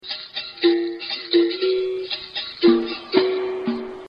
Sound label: caxixi berimbau; lateinamerika